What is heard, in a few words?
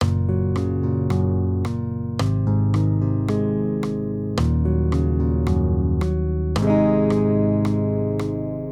maj triads